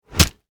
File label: Impact Punch Body Meaty Flesh; Punches and Hits; Combat